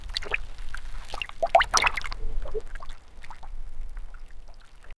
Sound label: gentle splash as a wooden, log